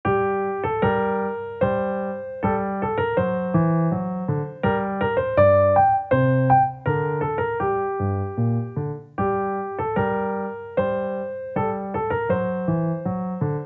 Polonaise in G Minor, piano; BWV Anh. 119; Bach; piezas faciles piano

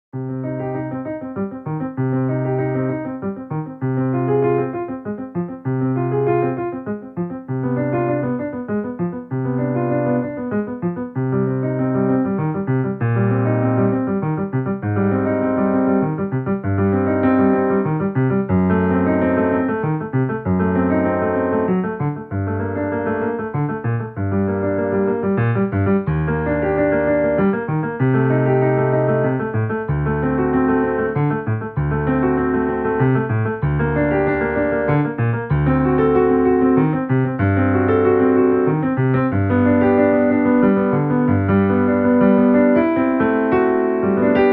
Prelude in C Minor, piano; Bach; piezas faciles piano

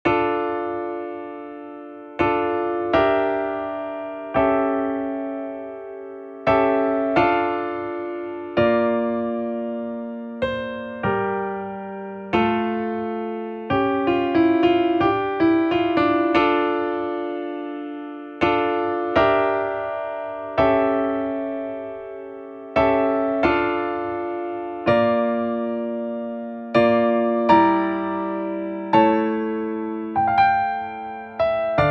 Chaconne, piano; Partita No. 2 for Violin; BWV 1004; Bach; piezas faciles piano

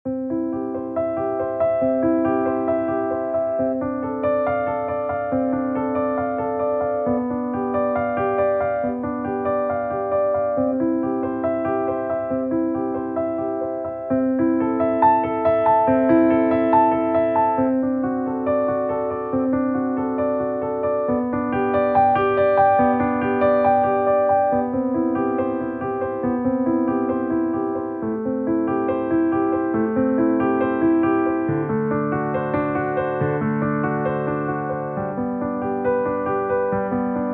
Prelude in C Major, piano; BWV 846; Bach; piezas faciles piano

